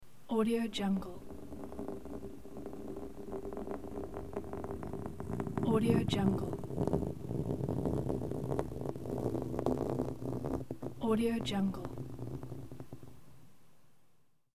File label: fingernail scratch